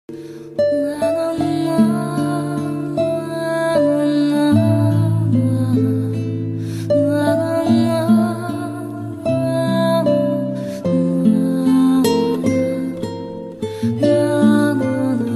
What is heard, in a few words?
sad music